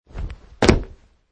Landing Wood, Man wearing jeans and hiking shoes jumping and landing on wood; wooden floor surface; Wrestling And Struggling Sound Effects